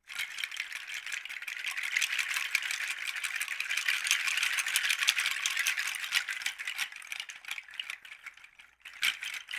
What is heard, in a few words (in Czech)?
chrestidlo pangi s poutkem